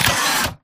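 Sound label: Stalker Movement Servo Damaged Head pivot Short; Titanfall 2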